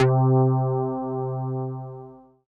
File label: Dance Floor Bass, Multisample Basses; Best Service